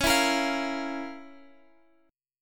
F Major 7th Suspended 4th Sharp 5th Db, guitar chord